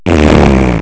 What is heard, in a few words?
fart